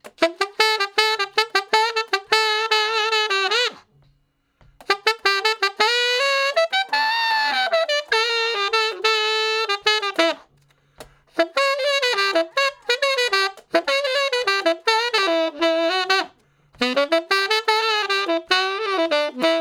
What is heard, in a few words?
Sax Shuffle (E); Solos; Ten Sax